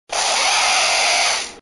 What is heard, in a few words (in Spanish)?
Grito; Tyrantrum